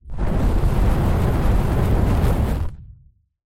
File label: dragon fire